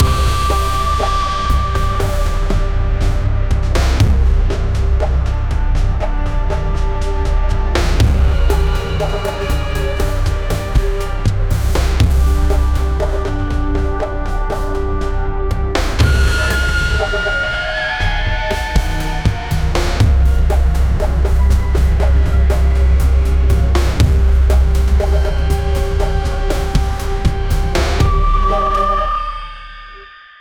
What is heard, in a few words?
clash of titans cinematic, rising tension